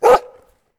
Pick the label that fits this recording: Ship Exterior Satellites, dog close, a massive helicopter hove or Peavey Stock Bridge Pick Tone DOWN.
dog close